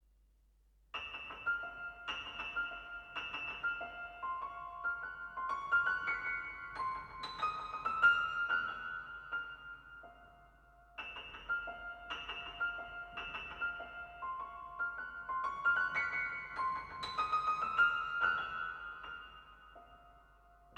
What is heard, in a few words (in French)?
carillon des etoiles